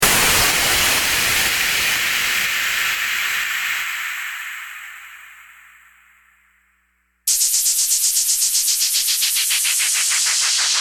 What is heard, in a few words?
EDM SFX